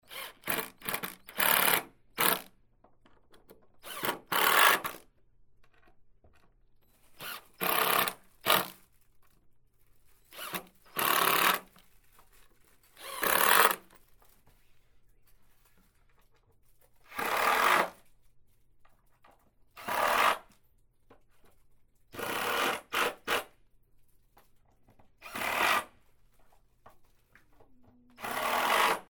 electric driver

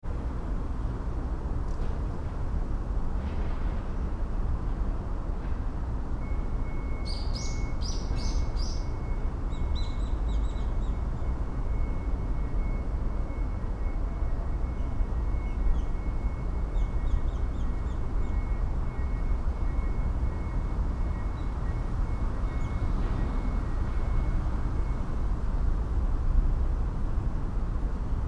city bird

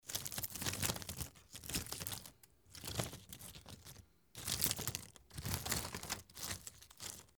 gasagasa

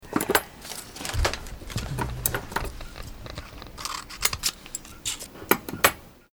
scissors

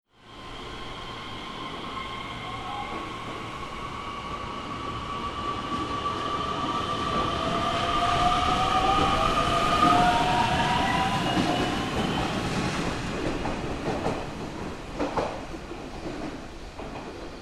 train

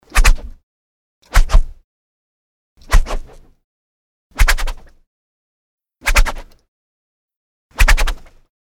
woosh

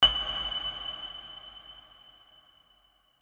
coming up with

